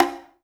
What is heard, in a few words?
PERC, 180 BPM; Ueberschall; Drum & Bass Resonance